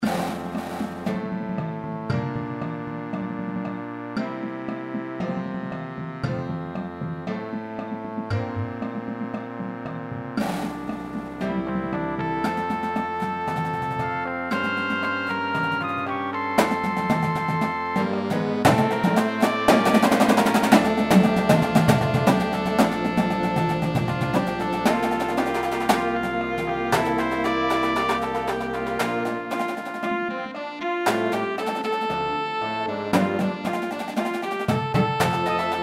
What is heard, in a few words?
perc